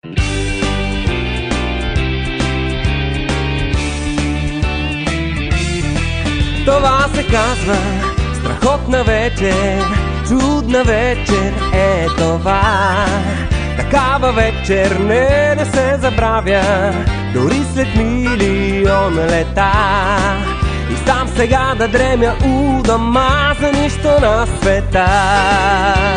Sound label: ROCK AND ROLL; BULGARIAN